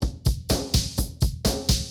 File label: Dance Drum, 125bpm